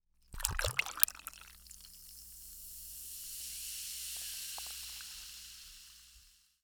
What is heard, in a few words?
Liquid Water Bottle Glass Liquid Soda Ice Pour